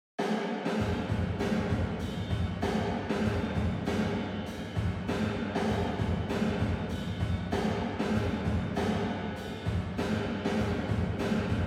Eventide SP2016 70ties Kit Little Plate; 100-60

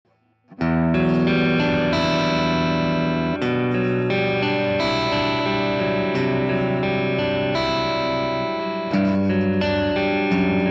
Low Gain Neck Voice 2, Schecter SLS Elite PT

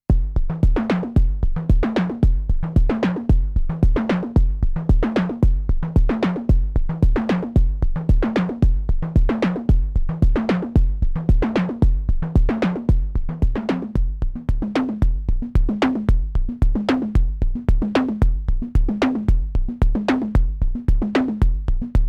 DFAM vs Edge Sequence

